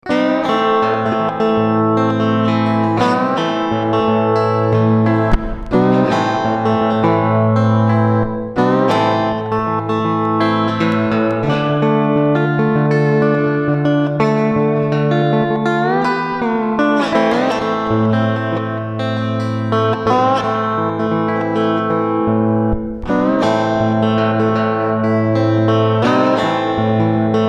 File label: lap steel